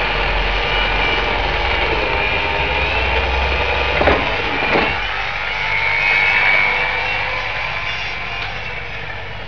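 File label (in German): Kreissäge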